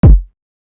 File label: Very Way More Thumpier, Kick, Drums